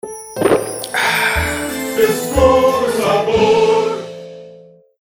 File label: ring